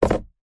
vehicles car washer stick flick internal nissan patrol; zapsplat